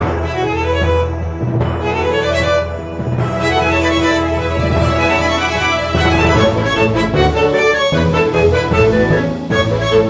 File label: Violinist bgm; Cookie Run